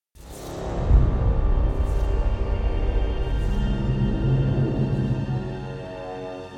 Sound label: Suspense; Bless